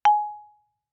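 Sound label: Marimba yarn fortissimo Ab4; MIS Pitches - 2014; Percussion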